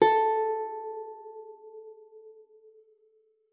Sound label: A4, guitar; Xsample VOL-2